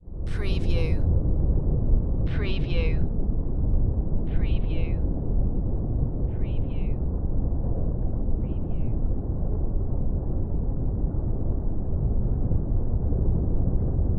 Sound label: Underwater Ambience